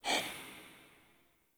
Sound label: Tarot UI Emotion Hover; Warframe; Harrow; Tarot Emotion Choice